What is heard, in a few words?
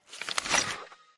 Ui Talk